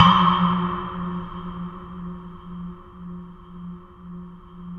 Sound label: space bass K; InVision Interactive; Lightware VOL-1; Instruments & Percussions; DEMBY